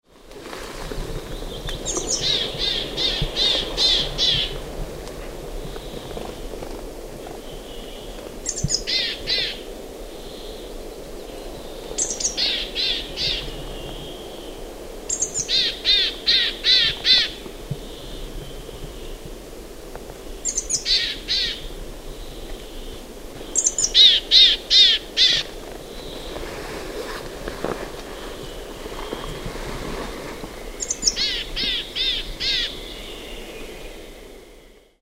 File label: siberian tit